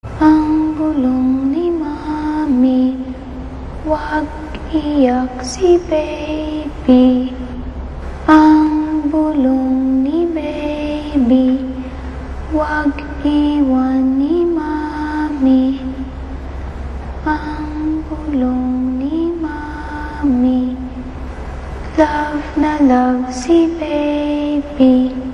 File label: Singing